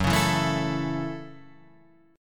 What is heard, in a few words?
F sharp Minor 7th F sharp